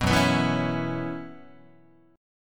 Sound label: F sharp 7th Suspended 4th, Guitar; strummed; 2,2,2,2,5,3; F#7sus4 Chord